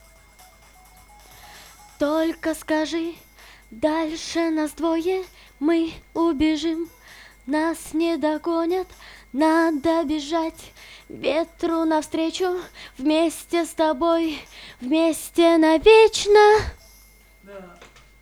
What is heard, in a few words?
VOX Couplet, acapellas